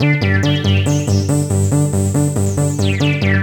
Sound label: fade in, 140bpm keyboard; hous-tec